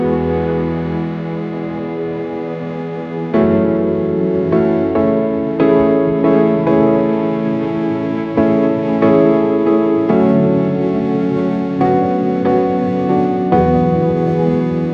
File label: orchestral strings, ringtones